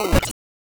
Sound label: Glitch Sound